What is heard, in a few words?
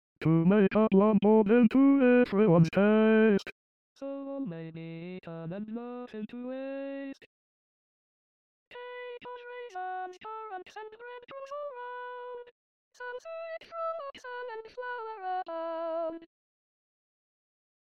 with piano bass